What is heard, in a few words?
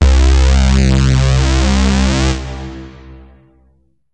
Vib, Synths BASS